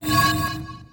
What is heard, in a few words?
menu back; YoRHa